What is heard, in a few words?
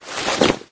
Equip